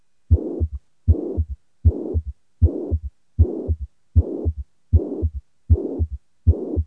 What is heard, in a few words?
mi regurg, zvuky srdce